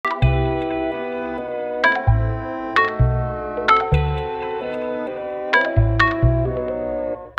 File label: Goosebump Sample